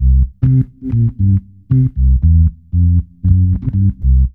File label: ORGN BS; E-Lab; X Static Goldmine 2; akai